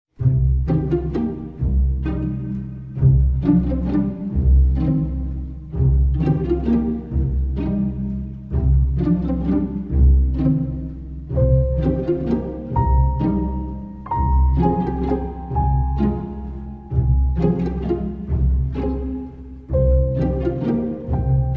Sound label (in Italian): piano e pizzicati